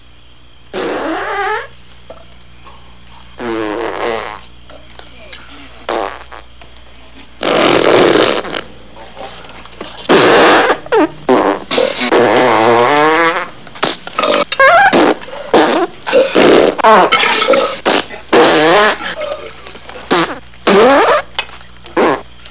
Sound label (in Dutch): Nare Gewoontes; BEANS